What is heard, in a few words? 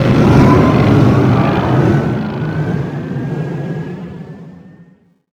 armada speeder fall down